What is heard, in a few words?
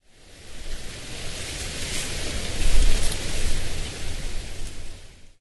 tree breeze; nodecore-skyblock; nc tree